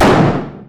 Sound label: shotgun fire